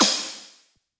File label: soft hit whistle, Taiko; osu